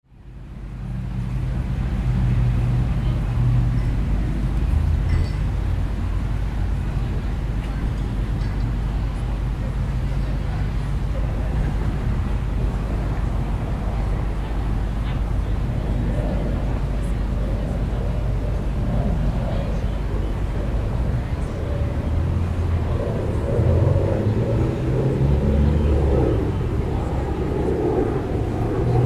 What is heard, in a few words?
City